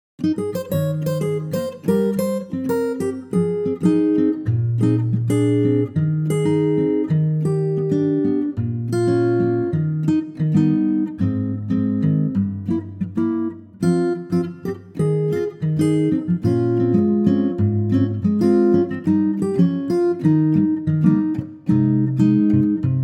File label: latin Acoustic Gtr Ab